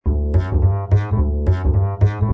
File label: upright cookin D; Lounge & Reggae; Loopology; Be Bop Baby